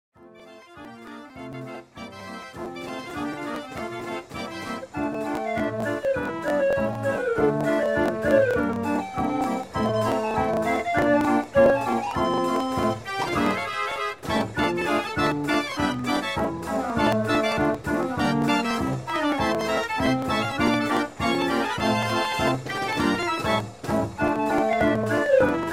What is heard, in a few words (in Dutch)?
draaiorgel; de pacific